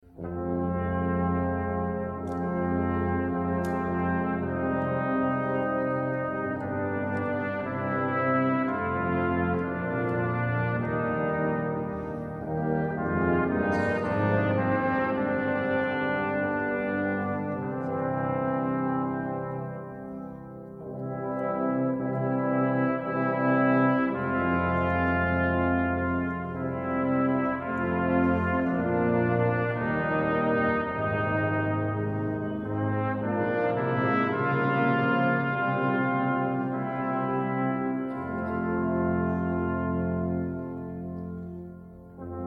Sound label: Symphony, orchestra choir brass percussion; Monteverdi; schnifis